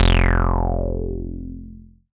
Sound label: BAS F1, SCI Prophet-5 synth bass